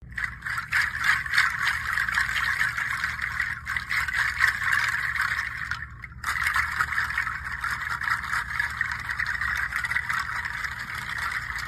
Pangi Seed Shaker

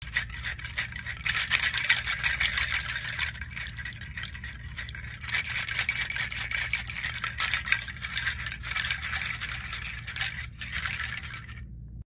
Pangi Seed Bracelet, shell shaker anklet

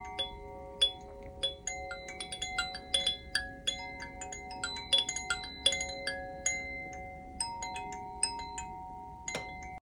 Red Summer Wind Chime; wind chimes red for summer

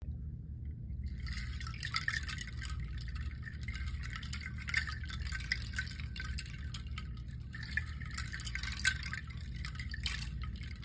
Mixed Seed Chimes Small

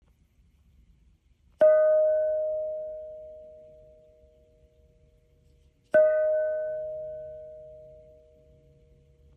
Sri Yantra Prana Gong; 15cm; serenity prana gong sri yantra 15cm 6

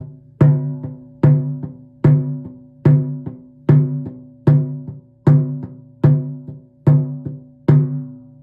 Owl Carving Drum, shaman drum

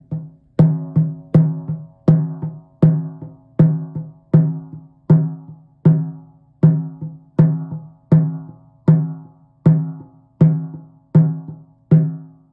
Goat Hide Drum, shaman drum goat hide and hair

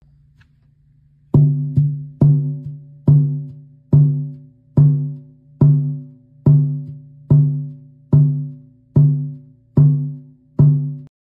Fur Mallet, fur shaman drum mallet; white

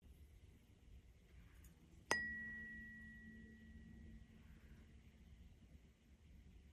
Third Eye Tuning Fork; tuning forks chakra color dark blue for third eye

